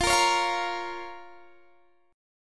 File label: F Suspended 4th Sharp 5th F, Guitar Drop D